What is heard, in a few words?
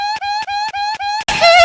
hee hee; ultra michael jackson